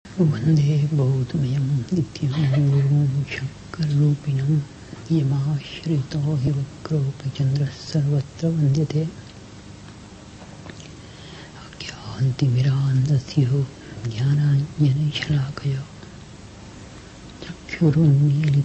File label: chant